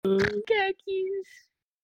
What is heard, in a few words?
snort